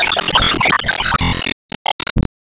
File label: Sp Acid ball Burst